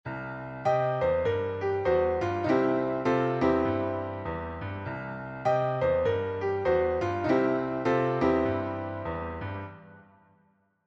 run, Blues Piano; Runs

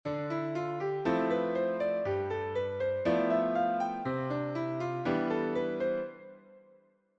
voicings stride; Accompagnement voicings en 'stride'; On alterne note de basse de l'accord, puis accord voicing; Enchainements ii V I

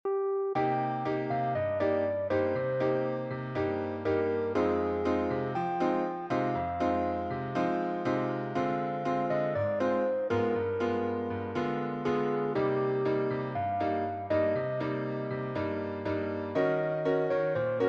acc bossa; Arrangement Bossa Nova; Piano Jazz

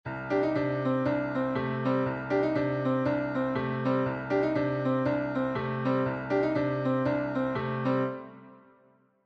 bluesy, Gospel Licks, piano

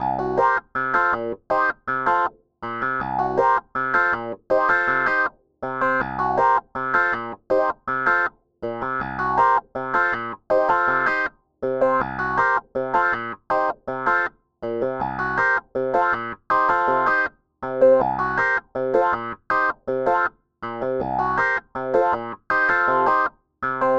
blues, Funk Blues, piano; Funk Blues 2; Second Line 2 + 3

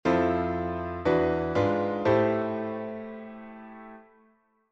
IV III vi, gospel piano; Enchainement IV-vi; Enchainements d'Accords

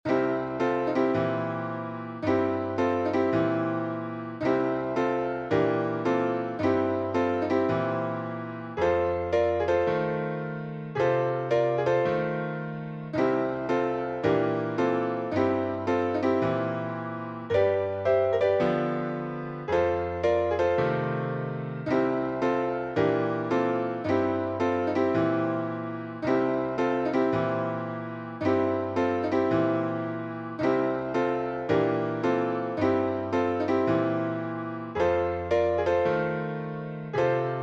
stride, blues piano accompagnement; Accompagnement Main Gauche

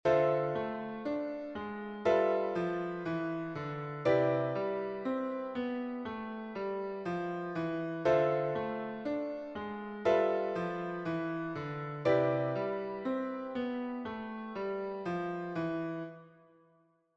walking, jazz; walking bass; Progression 1-5-1; Le mib de la dernière mesure constitue un chromatisme pour réenchainer sur le de la première mesure.; Enchainements ii V I

